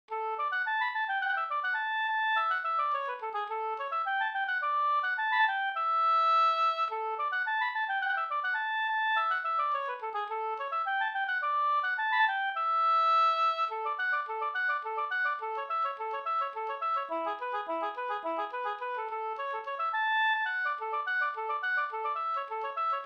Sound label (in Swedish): polska Bingsjopolska; e Pekkos Per nr2